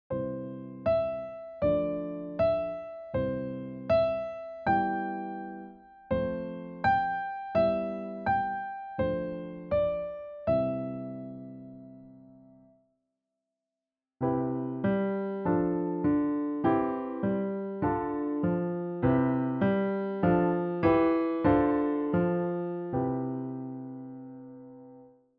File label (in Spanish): Piezas con acordes